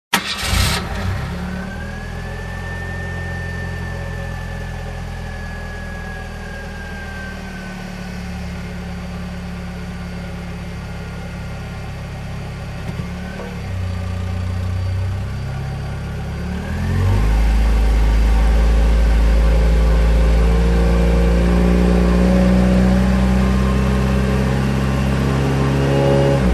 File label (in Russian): Завели автомобиль и поехали; Have started the engine of the car and have gone